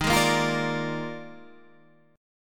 Eb Major 7th Suspended 2nd Suspended 4th Eb Guitar Standard; strummed; 11,11,8,10,11,10; EbM7sus2sus4